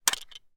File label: weapon gun ruger 10.22; still north media